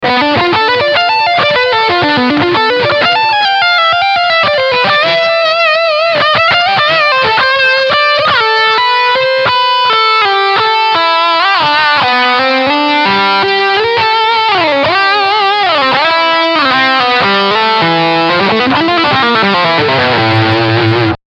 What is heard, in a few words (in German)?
Leadsound Steg Pickup